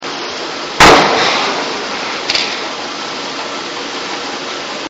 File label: bang echoes